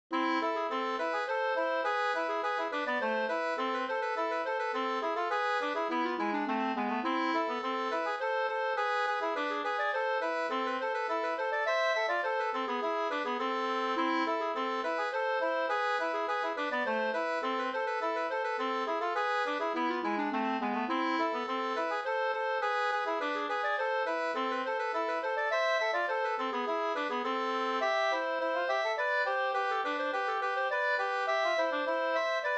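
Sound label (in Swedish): slangpolska, two-part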